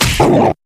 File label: grunt hit light; wild worlds